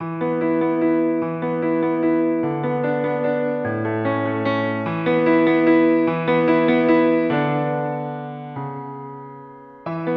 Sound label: Lion Waltz; Small Saga